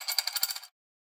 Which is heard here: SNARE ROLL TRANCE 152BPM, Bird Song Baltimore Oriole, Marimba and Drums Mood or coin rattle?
coin rattle